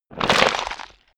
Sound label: stone gravel clatter; industrie-wirtschaft; baugewerbe